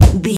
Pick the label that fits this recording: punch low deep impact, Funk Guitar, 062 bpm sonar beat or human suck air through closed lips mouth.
punch low deep impact